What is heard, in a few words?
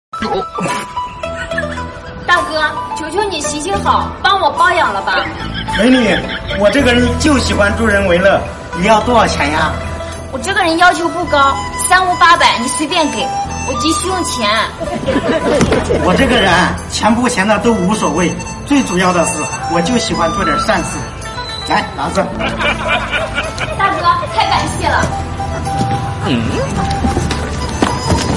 laugh; breadradio